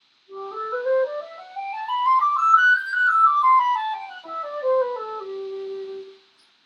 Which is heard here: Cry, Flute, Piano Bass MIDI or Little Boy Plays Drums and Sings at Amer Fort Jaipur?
Flute